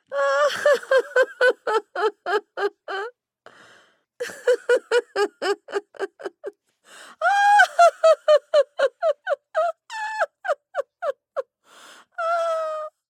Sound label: female hearty cackling laughter; sonniss gdc